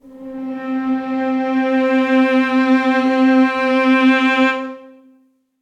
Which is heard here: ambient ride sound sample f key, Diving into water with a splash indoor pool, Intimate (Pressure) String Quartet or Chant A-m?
Intimate (Pressure) String Quartet